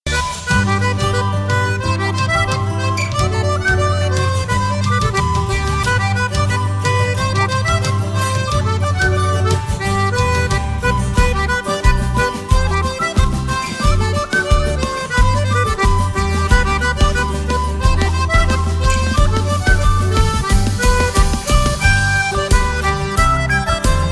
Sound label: Polka